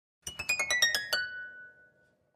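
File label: Harp Slow Short High Strings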